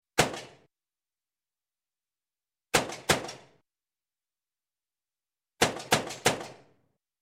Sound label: Gun, Hand Gun .38 Cal Police Service Revolver; Sound Ideas 2000 Series